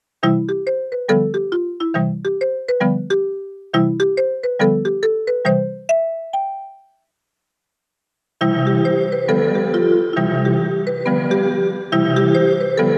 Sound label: 1.6 Sec. LARGE DARK, Alesis MidiVerb